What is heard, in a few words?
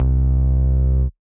Solid Tone Bass; Big Fish Audio; Old Skool House; OSH Bass